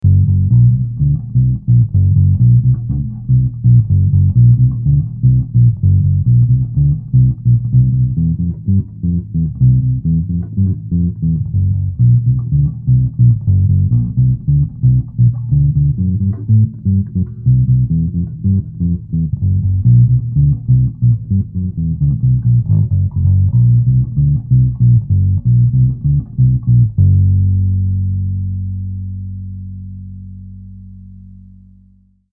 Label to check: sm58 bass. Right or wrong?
Right